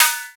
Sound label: Snare Ring & Snare, E-MU Proteus-2000